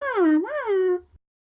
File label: wahh; Classroom